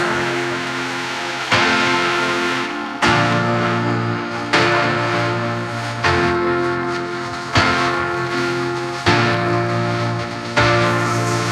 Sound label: Throat Singing Cat sad music; audiogen